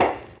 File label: Paper Snare